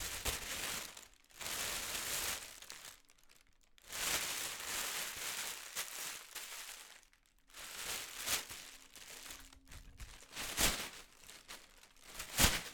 Plastic Bag

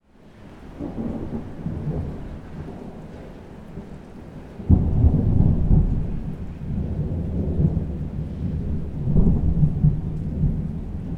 Distant Storm

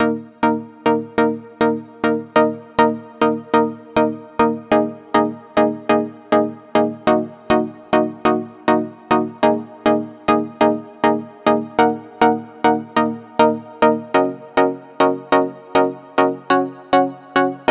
Weird Chords Pentatonic

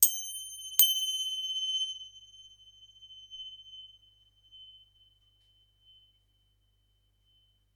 Little Cymbals Petites Crotales

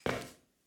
Jumping On Wooden Surface

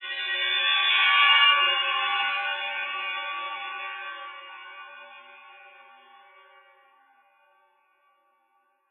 Scary Film Moment

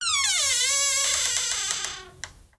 Creak

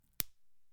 Breaking Pencil

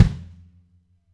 Yamaha absolute maple custom kick 22x18 bass drum

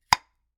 Jar Pop

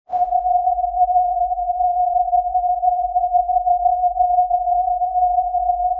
Spaceship

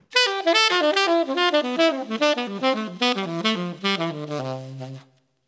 Descending Triads 12beat Dmaj 110

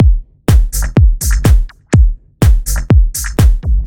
Beat

